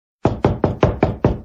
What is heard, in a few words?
Sort Sms